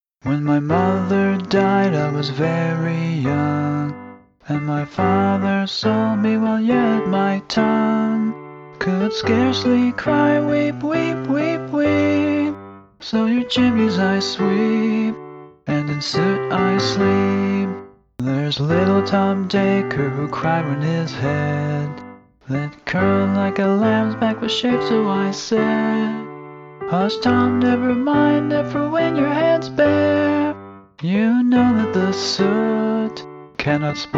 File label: scratch vocal